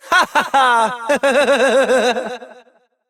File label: Evil Twin Doppelganger Laughter